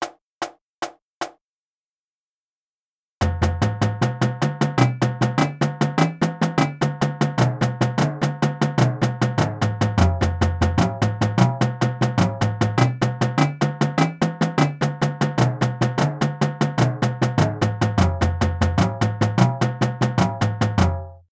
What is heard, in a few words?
Flams; Advanced Flams; Drumline Warmups; Compatible with pit